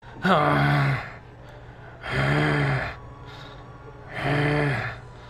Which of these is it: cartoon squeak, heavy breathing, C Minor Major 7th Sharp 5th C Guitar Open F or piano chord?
heavy breathing